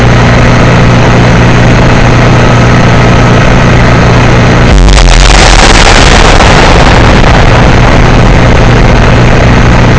I converted this microphone DQ signal to an audio wav file